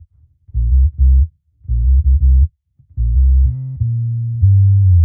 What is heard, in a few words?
Bass 95bpm C; musicradar; dub designer